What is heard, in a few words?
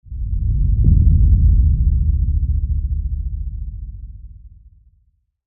Faint Sub Whoosh Boom